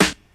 crisp hip hop snare sample b key

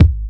rich low end bass drum single shot d sharp key

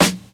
clear snare f key; Duration 0:01; snare drum samples

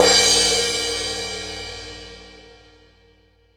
crash sound sample g sharp key

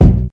boomy bass drum single hit b key

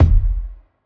puffy kick drum sample f key; kick drum samples

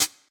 light hi hat f key; drum single hits one shots; hat samples

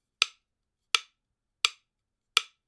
drum sticks sound recorded in near field

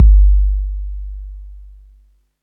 puffy kick drum sound g key; Samplerate 44100 Hz; Bitdepth 24

